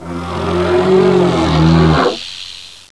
attack mounted gun; geneworm

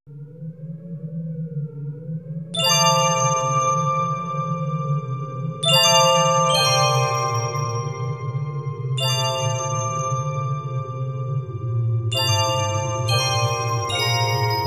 ice cavern; oot

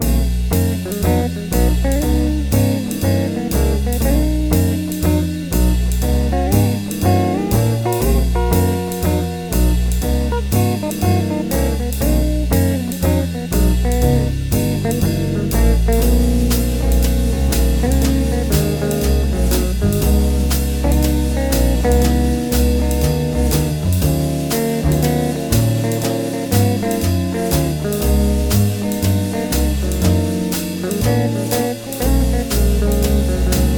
entrance loop

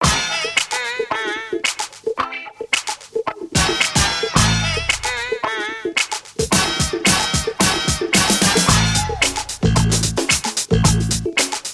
funky; dkc2

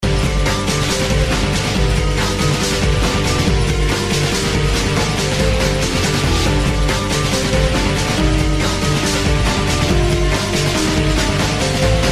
requiem

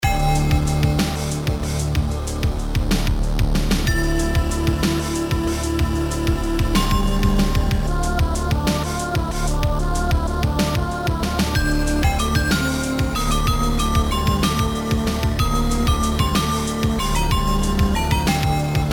jazz xmas

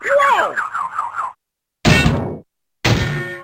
whoa

